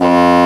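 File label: Contra Bassoon, F1; Digital Sound Factory Virtuoso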